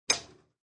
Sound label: Quick Kiss, Short pucker kiss.; Kissing; Human